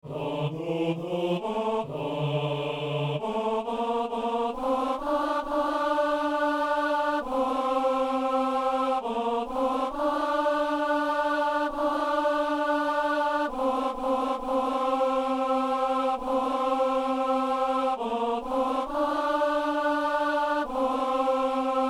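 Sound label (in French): psaume, ténor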